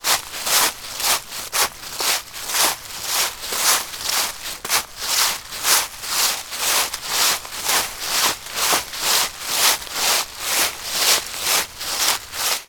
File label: foley footsteps dry leaves forest floor